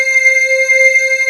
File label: DIGI WAVE, DIGI PAD